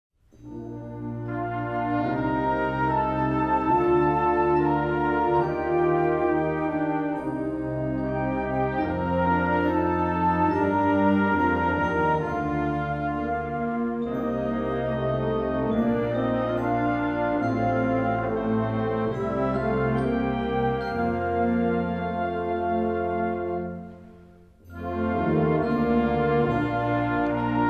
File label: concert b flat chorale